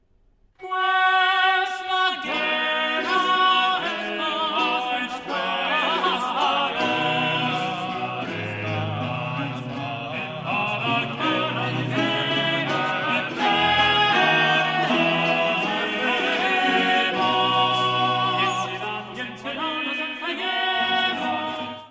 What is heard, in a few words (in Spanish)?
ensaladas; spanje